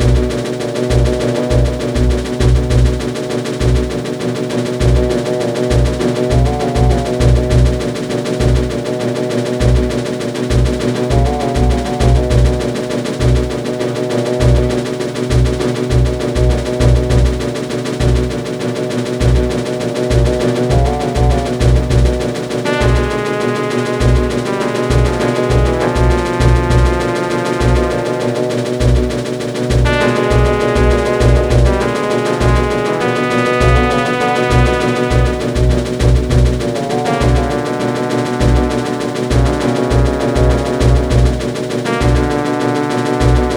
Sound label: battle, music; zelda